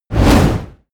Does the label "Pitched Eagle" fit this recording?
No